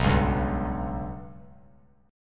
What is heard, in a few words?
B Suspended 2nd Sharp 5th; Bsus2#5 strummed; JGuitar